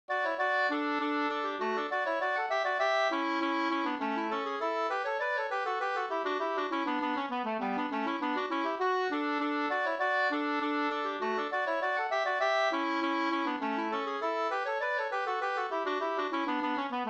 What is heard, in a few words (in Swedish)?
engelska